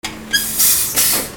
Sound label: INDUSTRY